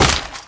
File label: impact wpn large earth